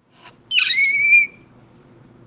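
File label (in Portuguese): grito, scream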